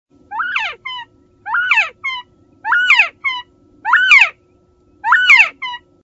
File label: fall covey call, bobwhites